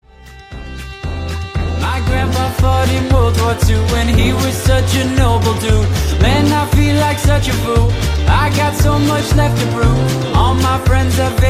Smallest Violin

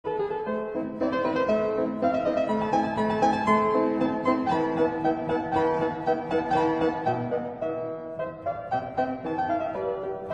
Mozart Turkish March

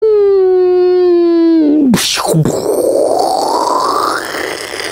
Nuclear Explosion

